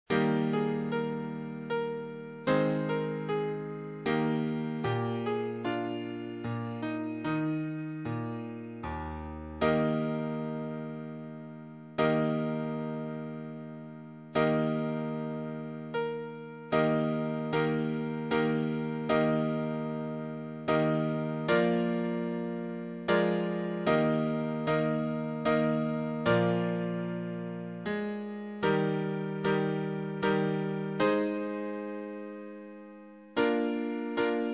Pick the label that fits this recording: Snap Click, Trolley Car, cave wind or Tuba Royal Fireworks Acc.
Tuba Royal Fireworks Acc